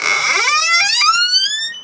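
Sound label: open door; Pharaoh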